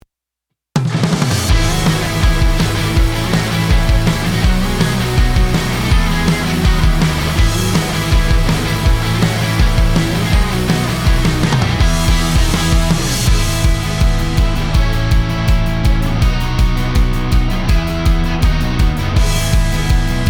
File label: Pop Rock 163